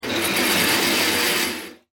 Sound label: switch